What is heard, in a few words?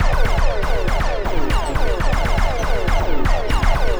Processed Perc